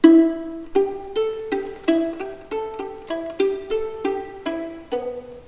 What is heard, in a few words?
pizzicato